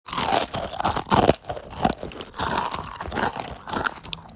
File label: Crunch